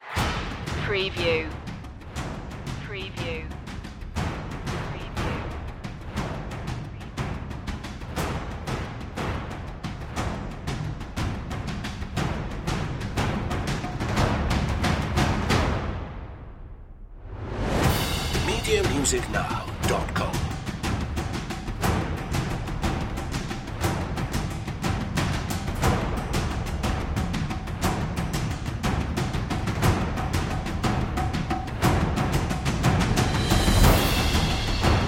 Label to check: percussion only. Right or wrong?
Right